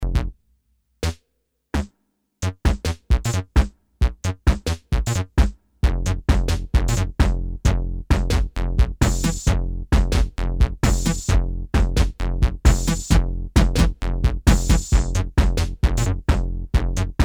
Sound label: mix, yamaha tx81z